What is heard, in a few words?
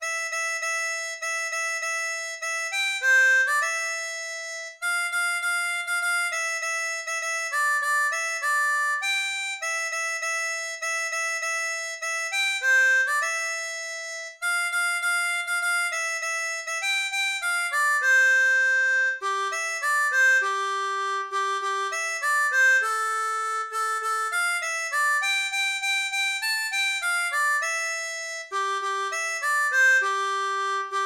Jingle bells, harmonica; Chants de noël